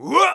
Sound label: warrior voice soft